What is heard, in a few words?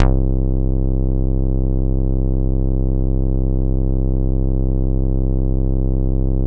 bass, synths